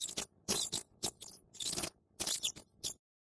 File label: Creature Sounds Spider; raycaster